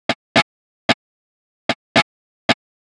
150BPM Guitar Fx; Antidote Advent

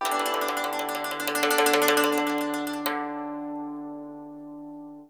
YANG CHING, Heart of Asia; Spectrasonics

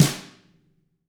tama hit; ILIO; Double Platinum Drums 1

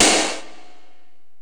Korg x5, Drums

